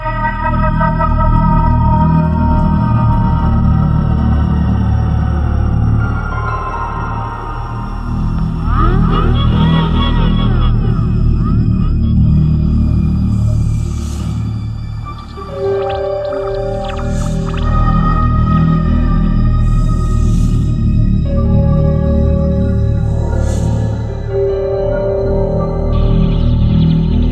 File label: BGM FOREST; Death Jr. II Root of Evil; PSP